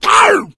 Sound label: hunter shred, attack voice; player; source engine; left 4 dead 2